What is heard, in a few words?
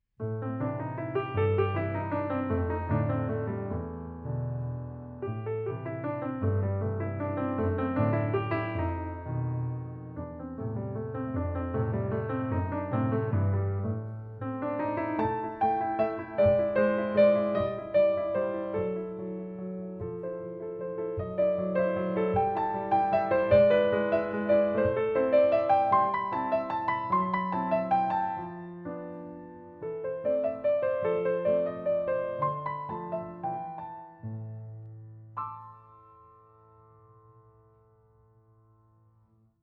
piano